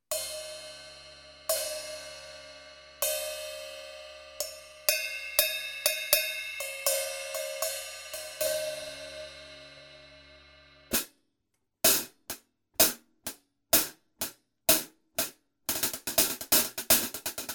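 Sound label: Hi-Hat Istanbul Agop Sultan 13, cymbales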